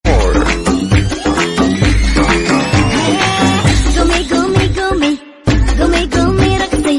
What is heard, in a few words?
English Remix Ringtone